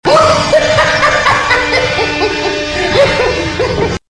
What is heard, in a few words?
Joker Laugh; Ledger Joker